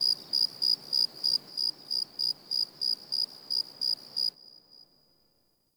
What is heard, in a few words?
Insect Night; Ambience; One Offs; Warframe; Eidolon Plains